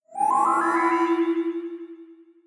sceptre aura, sfx abilities

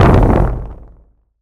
explosion; abilities

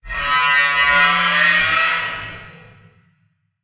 wendigo calm

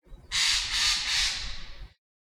birdcall, ambient; voxygen

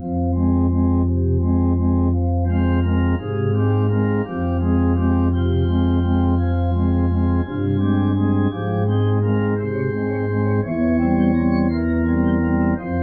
barrel organ

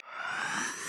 sceptre channeling

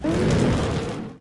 wyvern angry

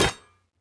block

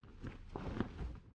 catch air

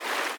fast water, river sounds

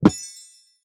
collect gemstone

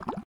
running water, river sounds

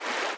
fast water, river sounds

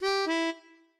melodica

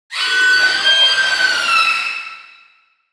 wendigo angry, utterance

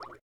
running water, river sounds

